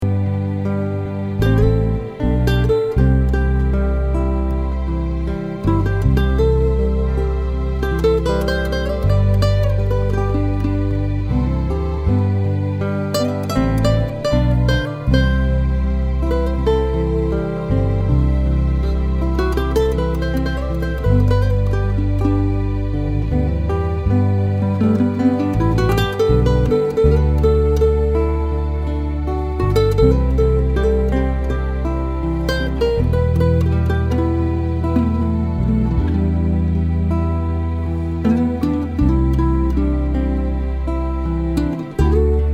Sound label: guitar solo